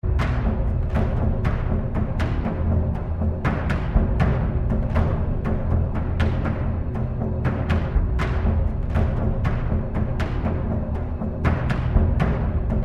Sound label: Storm Drums